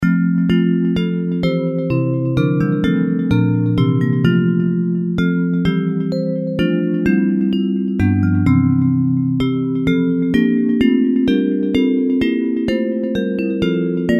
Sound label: Bells